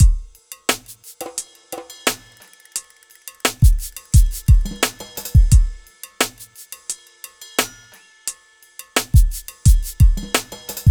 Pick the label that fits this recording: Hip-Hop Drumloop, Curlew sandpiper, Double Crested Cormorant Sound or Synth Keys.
Hip-Hop Drumloop